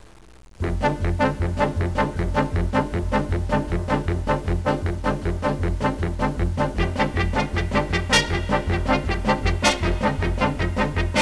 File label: xylo